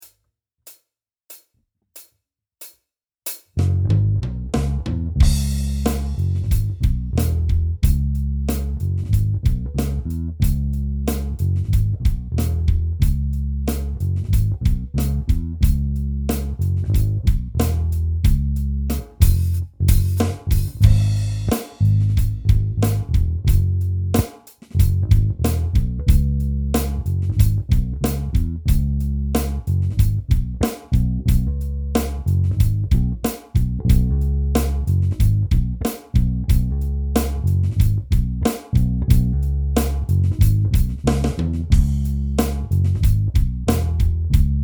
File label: rhythm